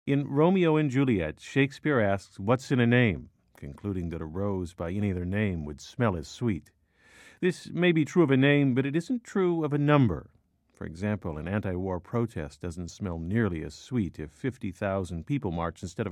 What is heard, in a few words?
crowd